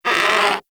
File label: predator vocal pain; Warframe; Hunting Critters; Characters